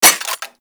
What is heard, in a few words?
Burst Rifle Mech; Weapons; Grineer